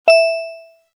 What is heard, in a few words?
ding Low; butterflysoup2